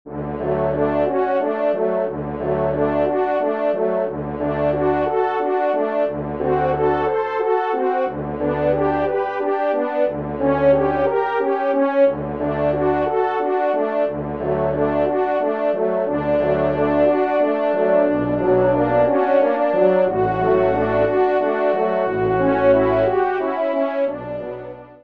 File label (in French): Quatuor, cor; Schubert; Ave Maria; ave maria schubert quatuor cor re arr anonyme